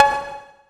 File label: 80s Drum Cowbell; Yamaha PSR E305